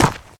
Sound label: gravel, dig; HunterZ G4; minecraft